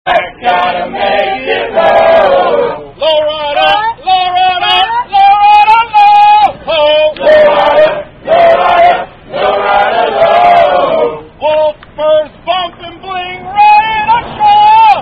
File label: Low Rider, Low Rider, Low Rider Low, Cadence